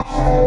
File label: D STAB, Funky Underground